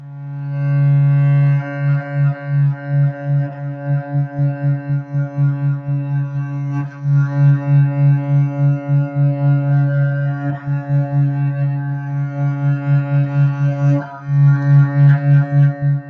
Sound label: wolftone